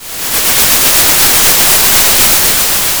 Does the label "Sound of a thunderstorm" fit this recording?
Yes